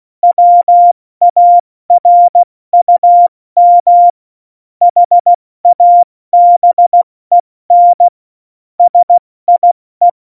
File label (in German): Witz, Morsekurs, 70 F80; Witze